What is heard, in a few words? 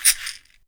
KACHICHI; Univers Sons - Raricussions